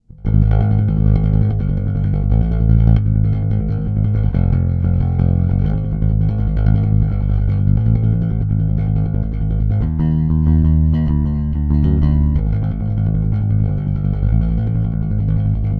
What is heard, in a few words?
Both metal pick